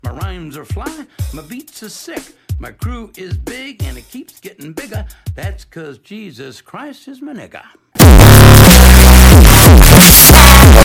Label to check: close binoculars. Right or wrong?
Wrong